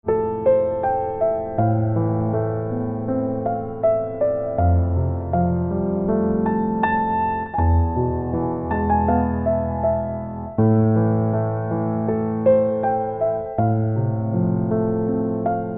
Piano, instrumental ringtone

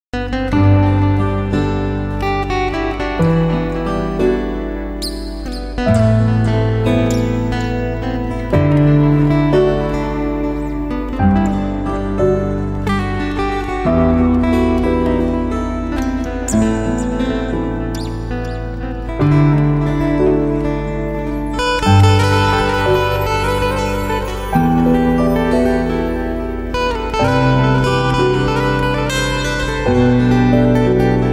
Guitar Slow

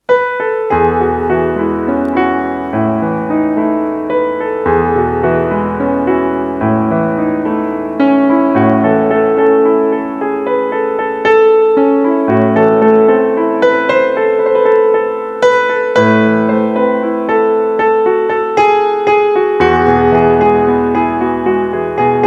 Piano

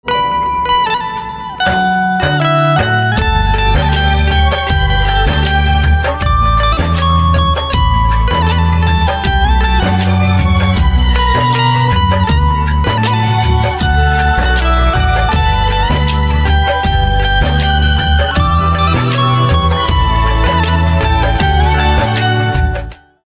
Banjo, instrumental

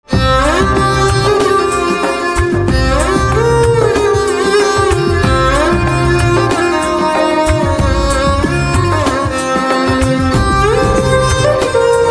Violin

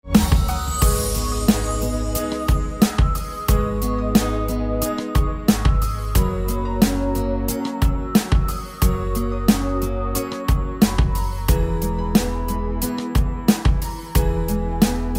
Xylophone

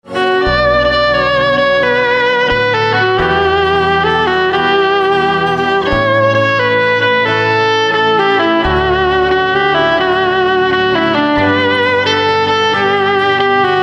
Violin

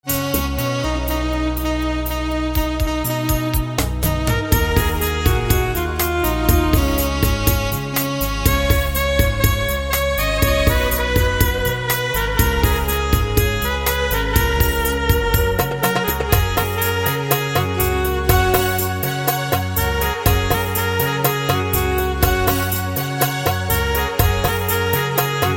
Saxophone

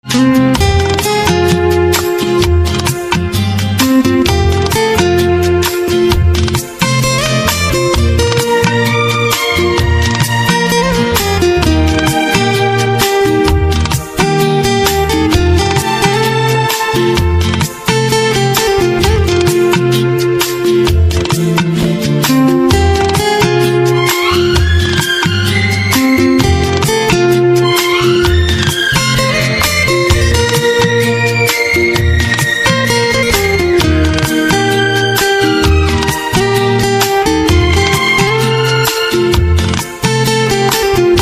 Guitar

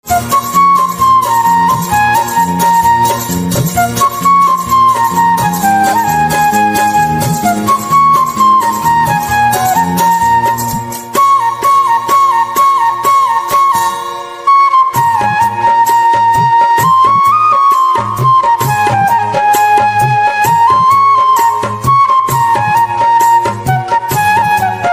Flute

